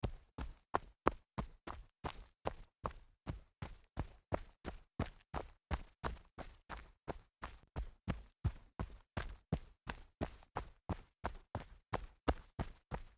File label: sneaker jog dirt